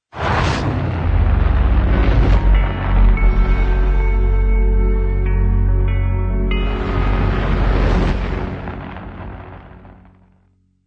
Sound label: Eternal Flame Logo Full